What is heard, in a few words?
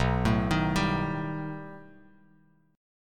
B Augmented 7th B down Bass Standard; arpeggiated; JGuitar